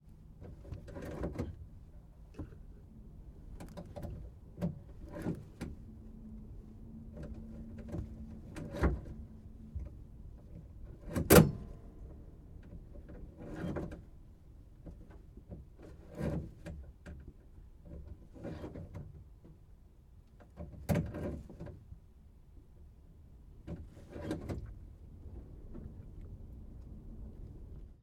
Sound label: extra 300 prop rpm maneuver lever; sonniss gdc